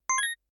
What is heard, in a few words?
power up; Kenney's Sound Pack; Digital Audio